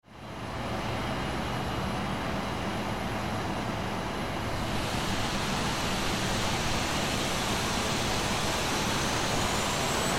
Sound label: 737 startup